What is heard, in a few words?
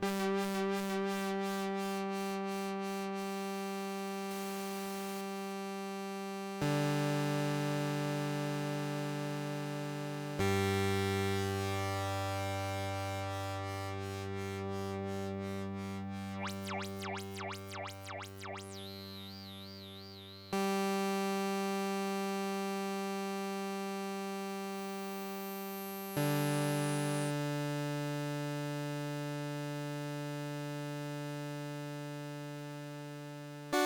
G drone